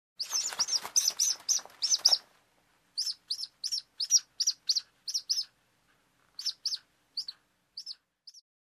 Chicks chirping

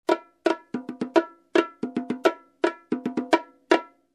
Conga Groove 3 16th

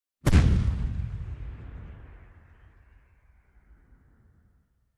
Heavy Shotgun fire

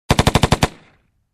Machine gun shooting close

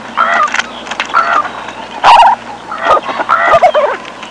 Whiporwill sounds

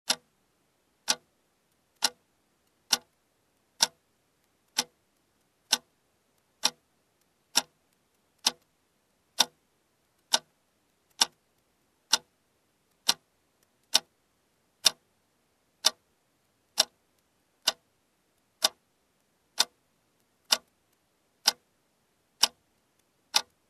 Clock ticking

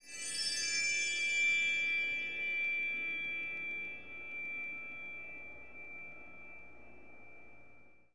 Bell tree slow fade

